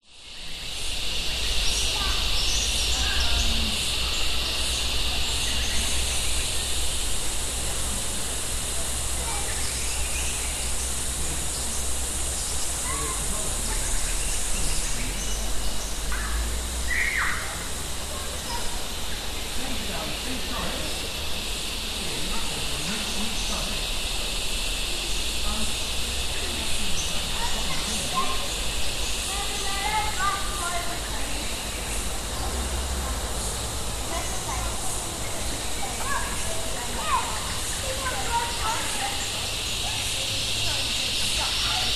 campsite ambience summer forest insects birds vehicle kids adults chat close; nature